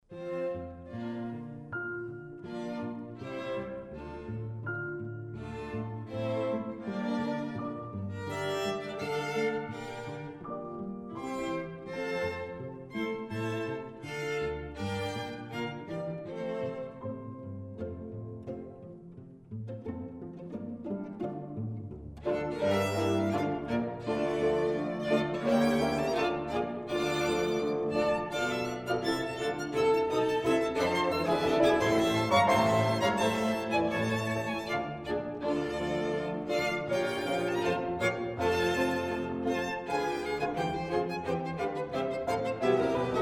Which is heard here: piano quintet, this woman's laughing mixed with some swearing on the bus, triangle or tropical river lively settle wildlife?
piano quintet